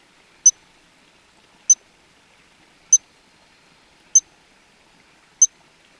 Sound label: Stumpffia jeannoeli